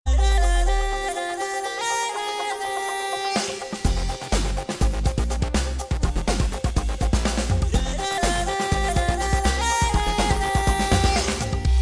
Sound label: Salt And Pepper Drum